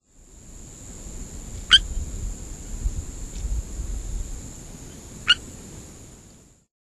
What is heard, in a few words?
Boophis tephraeomystax